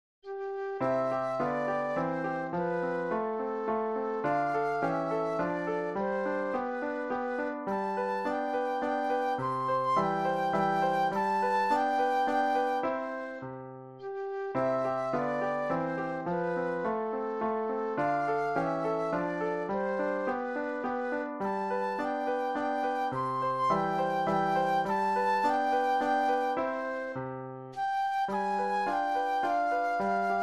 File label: Waltz Flute Solo; classical; Schubert; op. 9 no. 2